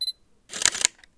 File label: Sony A7 II Autofocus Shutter Mechanism Sound